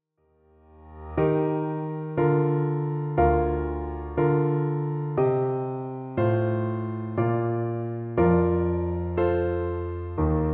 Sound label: sarabanda; g tartini